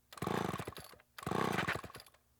chainsaw pull starter run revs buzzy grind

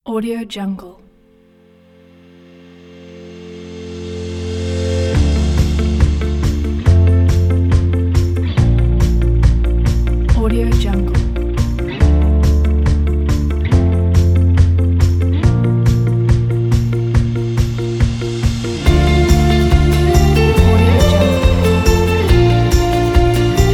uplifting indie folk

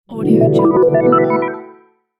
digital heal spell

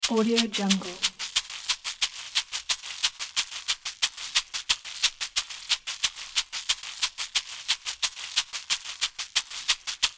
shaker loop

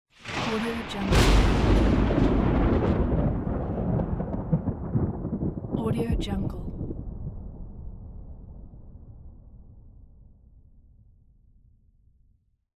thunderstorm

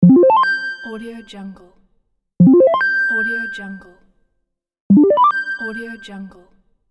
win notification

